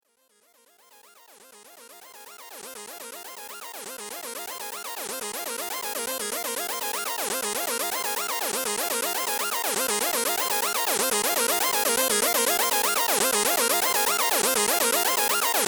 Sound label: portamento pattern